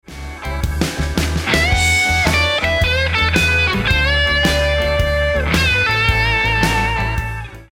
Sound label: Licks Lick, guitar